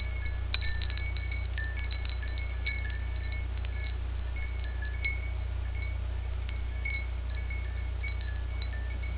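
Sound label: chimes metal small; windchimes; chimes mtl sm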